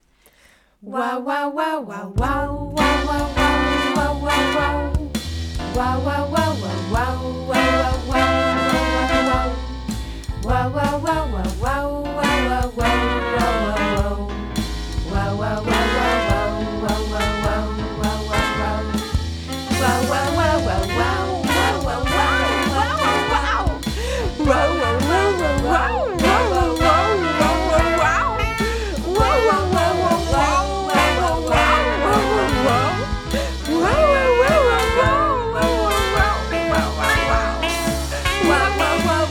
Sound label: Big Band; Wawawa; Pillou